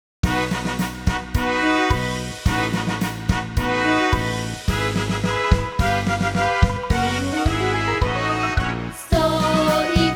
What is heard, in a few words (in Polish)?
Hymn przedszkola wersja wokalna